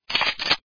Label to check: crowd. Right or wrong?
Wrong